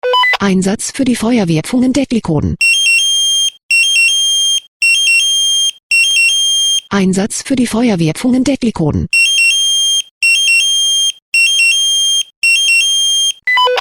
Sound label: SMS; FWPfungen